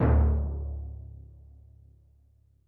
TIMPANI, SY99 KIT; Crypton - DrumBase5000

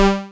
WHAP G3, SYNTH, Trance; AMG; Global Trance Mission VOL-2

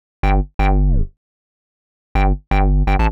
125 Hard Synth Bass; PowerFX - Nu Jazz House

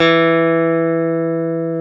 GTR, Dual Guitar; Club-50 - Foundations Roland

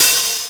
DANCE SET, DRUMS

